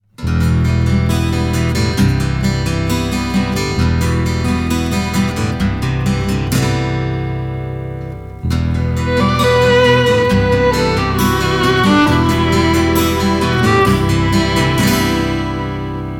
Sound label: guitar violin style sample